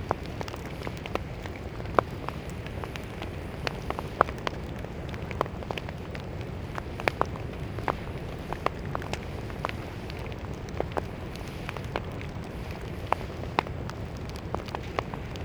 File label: Amb Burning; Bruce Lee Quest Of The Dragon; XBOX